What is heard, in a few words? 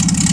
propo; PC Gamer IT CD 35